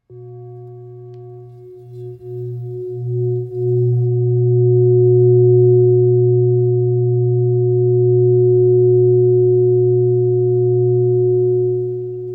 Bol bengale fa diese

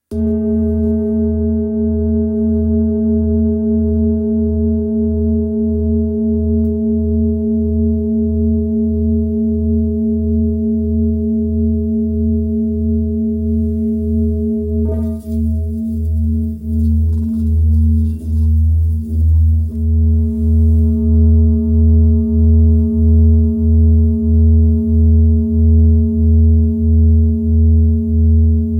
Bol ramgandi re

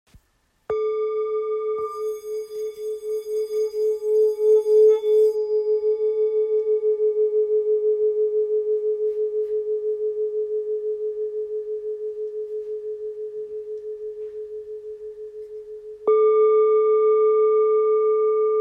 Bol ancien re

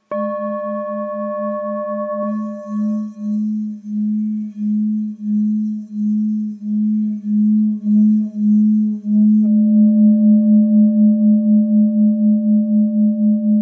Bol ancien sol diese

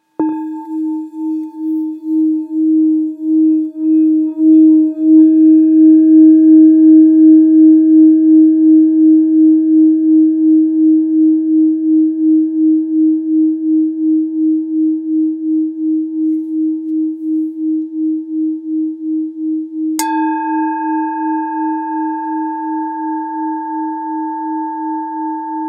Bol thick la 1.8kg

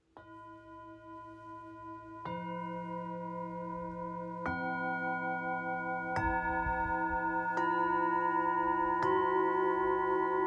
Set harmonique

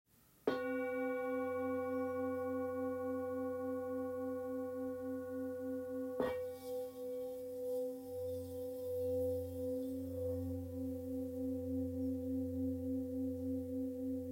Bol devjam do 2,21kg

